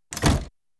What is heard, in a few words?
Diesel Trains Door closed; BBC Sound Effects Library